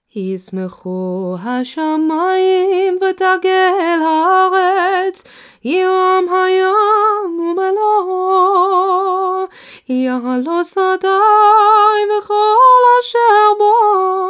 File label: Psalm 96 Closing Nusach; kabbalat shabbat